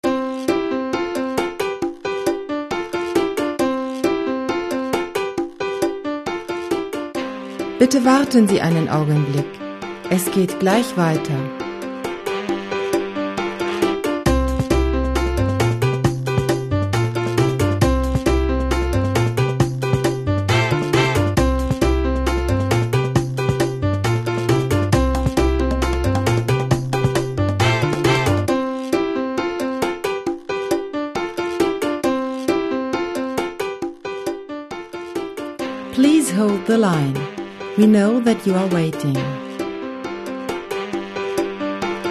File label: jingle